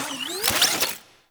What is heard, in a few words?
Drone Switch In; Warframe; Deimos; Cephalon Drone